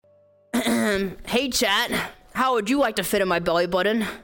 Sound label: fil bellybutton pickup